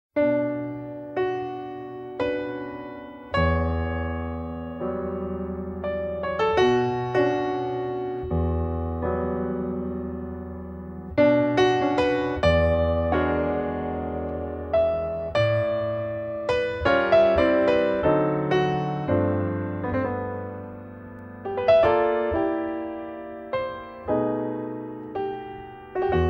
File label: B Minor Waltz